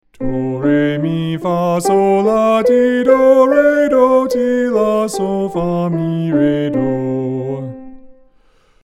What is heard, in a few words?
Major scale solfege